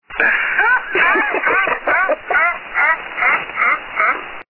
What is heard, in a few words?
Cackle